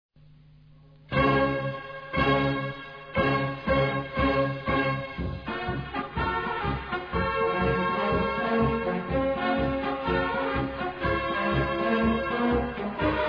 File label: polka